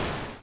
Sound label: door; Wicked-Depths